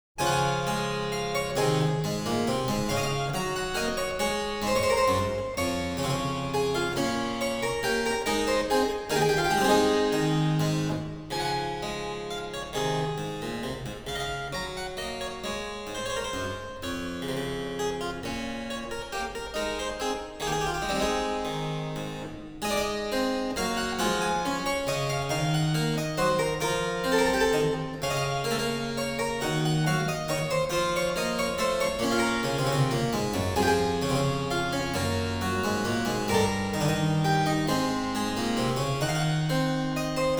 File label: Menuet